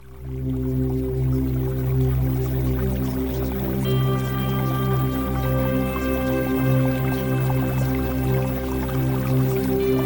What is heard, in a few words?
Music